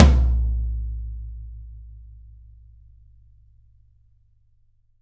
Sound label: YAM18 ROOM L, Drums; Big Fish Audio; Ross Garfield Drums VOL-2; ST LOUIS KIT